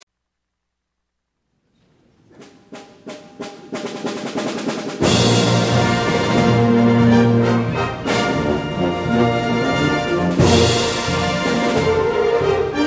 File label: Fanfare and Flourishes; memorial day